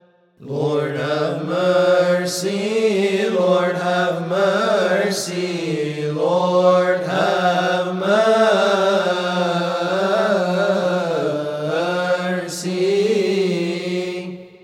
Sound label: Lord Have Mercy